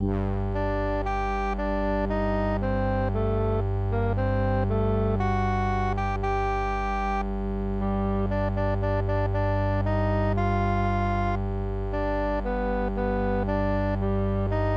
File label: MIDI; BWV10